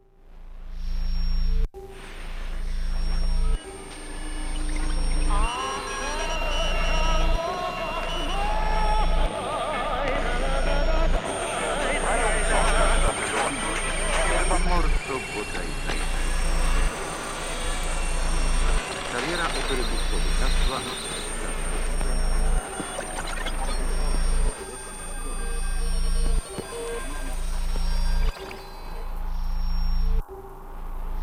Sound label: Rua da Alfandega, Lisbon